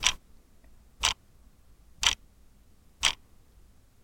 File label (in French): tic tac